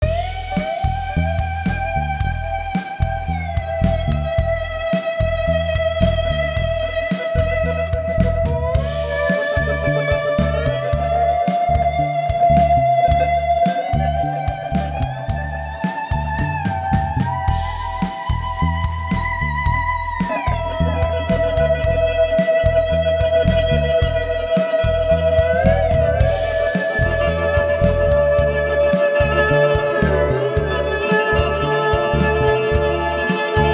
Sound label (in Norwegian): Glissando gitar